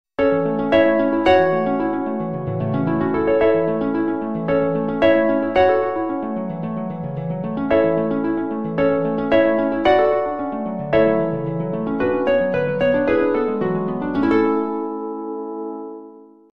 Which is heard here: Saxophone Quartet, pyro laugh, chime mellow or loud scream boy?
chime mellow